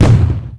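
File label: Machine Gun Explosion Craft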